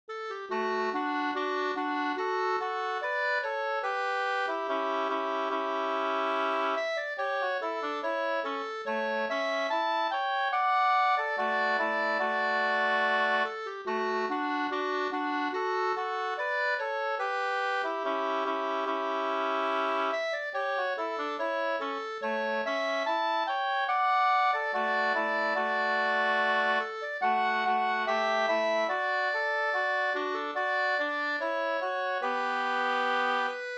brudmarsch fr Kökar; e Albin Palmqvist

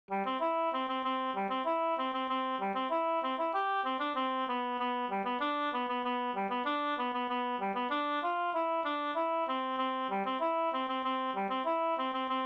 snoa Jamtsnoa C F dur

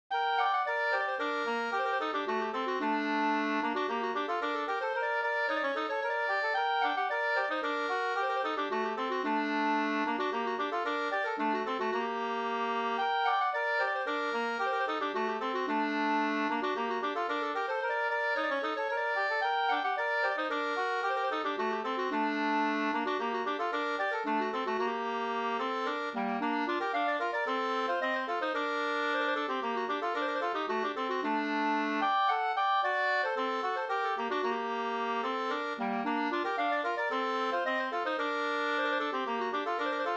polska Bingsjopolska g moll polskan; e Pekkos Per e Pekkos Helmer 2st

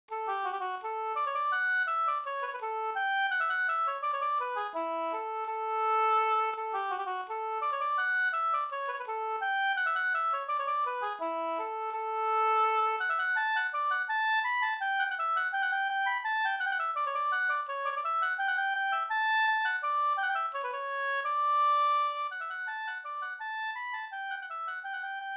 ganglat; fr Bingsjo e Hjort Anders

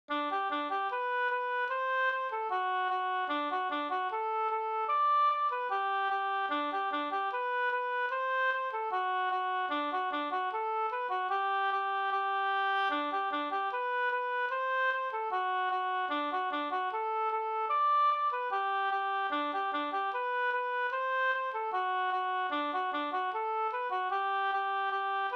polka; Axevallapolka Vg

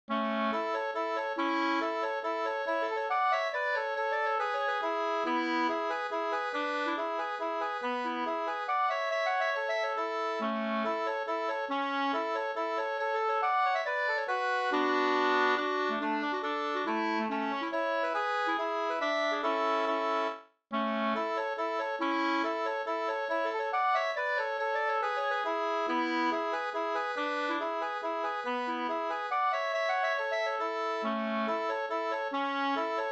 mazurka; Pa sormlandsk loge av Ivar Carlsson 2st

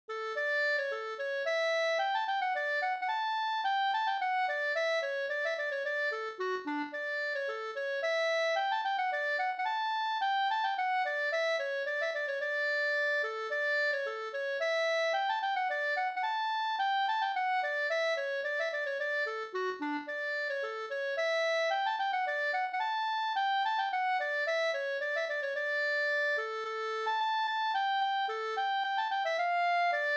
polska Slangpolska

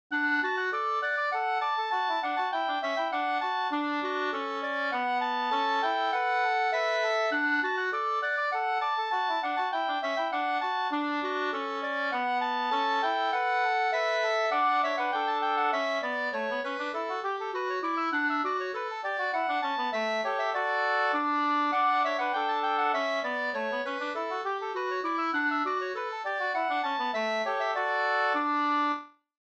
slangpolska 2st

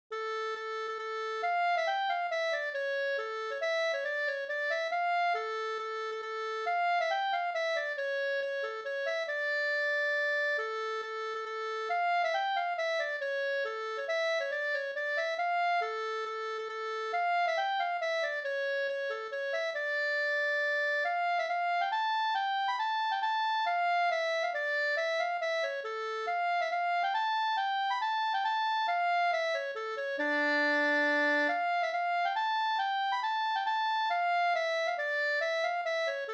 mazurka

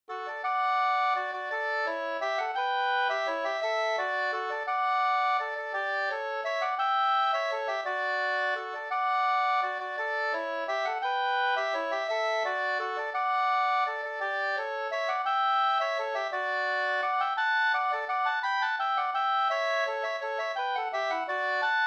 vals Norskvals

